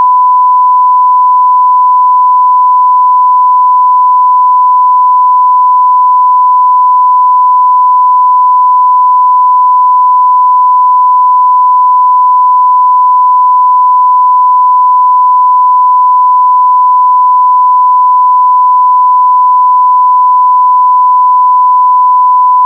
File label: sine